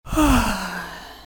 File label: sigh of relief